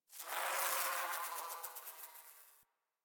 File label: Insect Day